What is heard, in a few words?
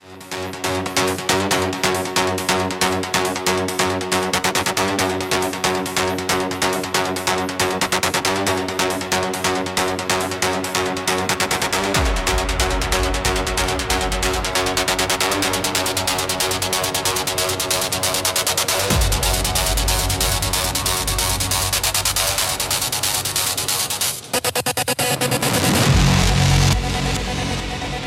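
hardstyle remix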